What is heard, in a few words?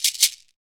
SHAKER, Afro Shakers, Shake&Rattle